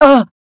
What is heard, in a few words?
pain; astra